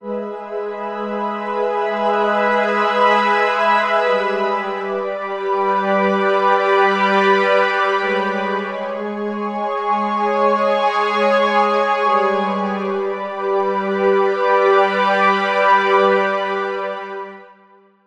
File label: VSM IV Expander Strings; GForce